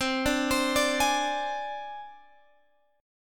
C Suspended 2nd Sharp 5th C down Guitar Open G

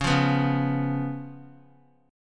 C sharp 7th C sharp; strummed; C#7; JGuitar

